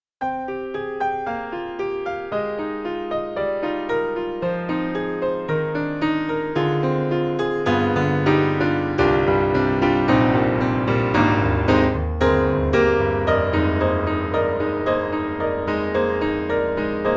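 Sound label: Yamaha C7 Player Jazz Venue, piano; Steinberg; The Grand 3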